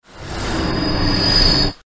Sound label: blood echoes suck